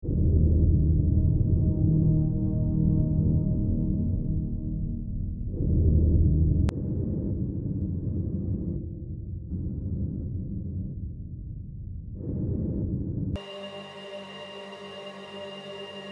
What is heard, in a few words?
Atmos Textures